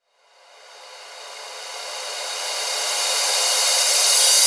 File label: Cymbals Reverse; Vengeance Samples